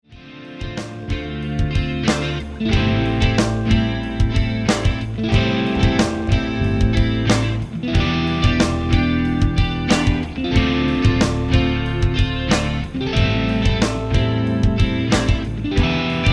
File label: Rock Tracks